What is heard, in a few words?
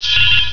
user login